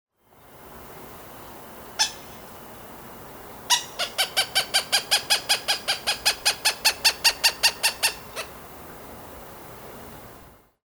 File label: Coopers Hawk Accipiter cooperii